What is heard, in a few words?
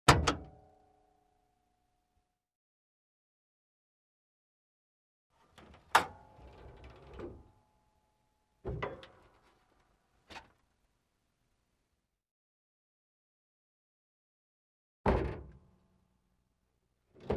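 Sound of hood opening and closing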